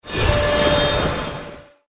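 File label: charge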